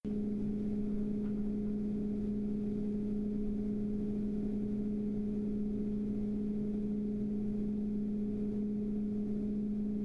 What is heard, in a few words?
Max RPM